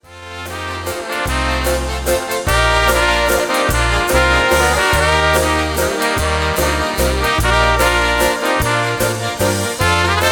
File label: Waltz